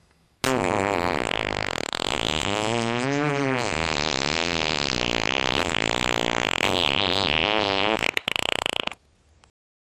fart

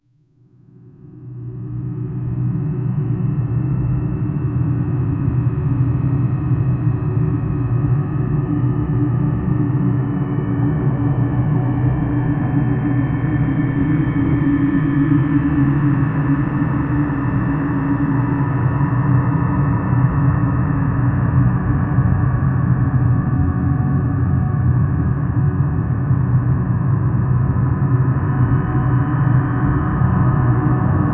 slow ominous drone sound

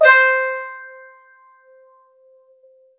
high fidelity, harp

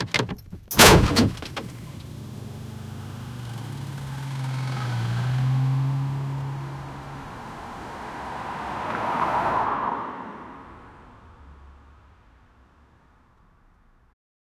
head and cars crash

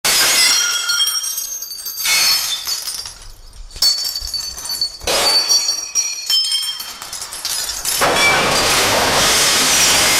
the sound of a collapsing, catastrophe

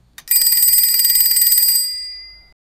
sound of an alarm clock, annoying

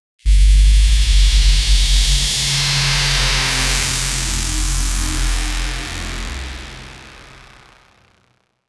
epic cinematic electric, bass rumble

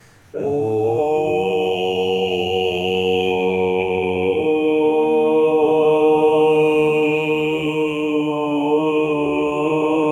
a man singing with a, acapella

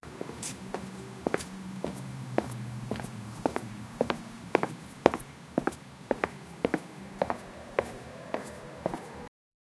someone walking, indoors

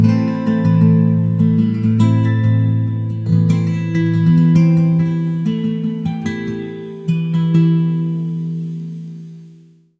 warm acoustic guitar stru

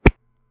the sound of a light, gag

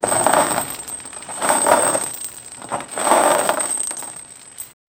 objects

